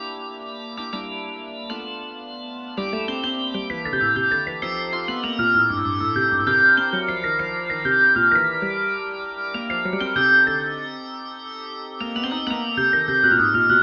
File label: Hammond B3 Mellow Vibes; Master Hammond B3; Syntheway